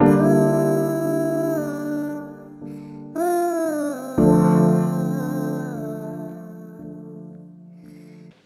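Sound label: piano autotuned, Sad Loops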